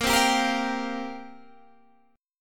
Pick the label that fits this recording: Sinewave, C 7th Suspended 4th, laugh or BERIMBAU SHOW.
C 7th Suspended 4th